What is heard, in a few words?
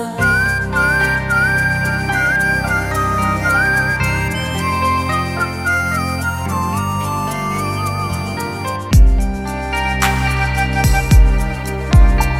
Instrumental BG